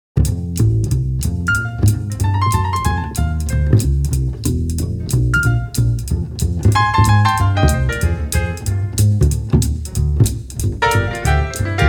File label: Orchestra